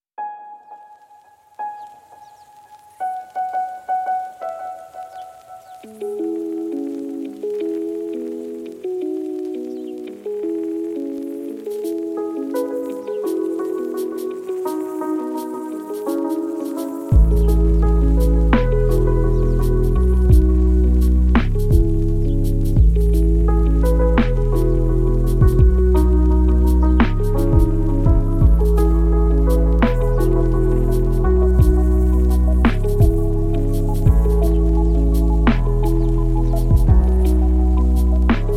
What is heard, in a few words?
instrumental lofi